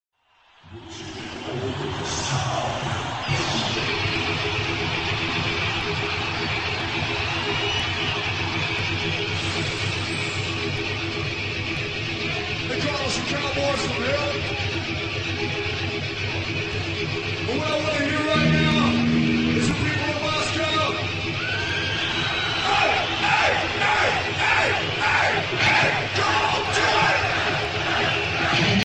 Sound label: rock ringtone; iphone se